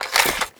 Gun Holster Weapon, Weapons Foley; SFX Kit

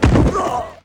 Football Hit Tackle

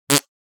Fart Foley - Surprise - Short; Hollywood Edge; sound designer's toolkit v2

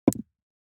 DICE on Felt, Throw and Roll, Metal, 2 Two Dice; Samples; ArticulatedSounds; DICE Sfx Party (Extended)

